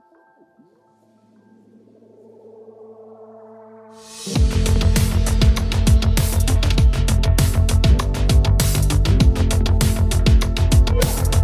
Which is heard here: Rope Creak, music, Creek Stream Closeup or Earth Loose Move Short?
music